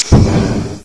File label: air turret fire, vehicles; hl2